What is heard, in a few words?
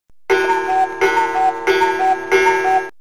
Cuckoo; LG; U8500; FolderTone